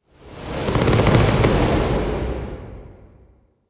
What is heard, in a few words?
Tower Trunk Movement; Warframe; Quests; TheNewWar; Ambience; Cetus; Unum Tower